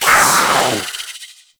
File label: Kavat Vocal Attack Medium